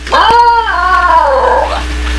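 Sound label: female basenji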